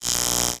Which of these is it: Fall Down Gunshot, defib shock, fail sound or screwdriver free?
defib shock